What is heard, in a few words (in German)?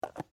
Tupperbox